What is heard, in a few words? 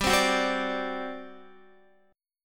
G Major 7th Suspended 4th, G, down, Guitar; GM7sus4 strummed; JGuitar